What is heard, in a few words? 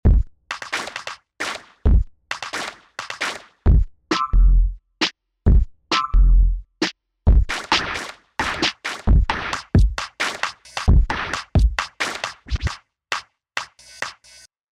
Beats; Kontakt7